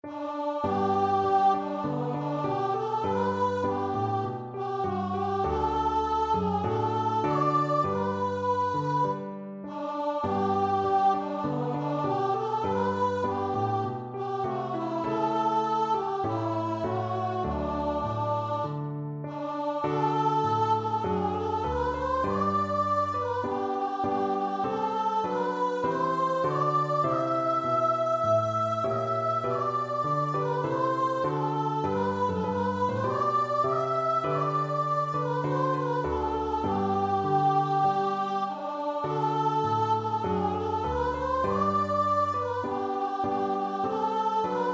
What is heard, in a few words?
albanian nat voice